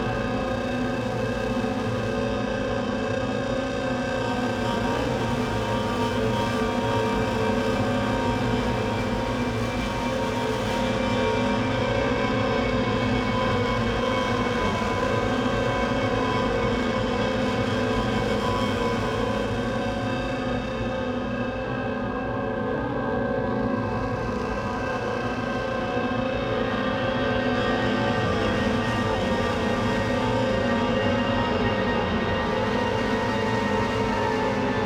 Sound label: Degradation, Horror Atmospheres Music